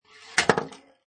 Pinball Sound Ball Slides Of Rail And Falls To Floor; Video Game Sound Effects